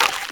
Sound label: bite; Fate The Traitor Soul